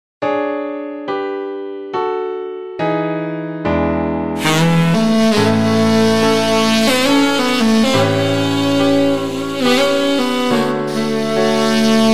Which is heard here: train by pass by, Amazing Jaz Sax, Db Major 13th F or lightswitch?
Amazing Jaz Sax